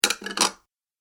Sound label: Saucers Stacking Plates, dishware